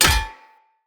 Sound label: Hammer Impact Parry; Weapon Impacts; Player; Combat; Tuvalkane Hammer